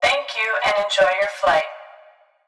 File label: vox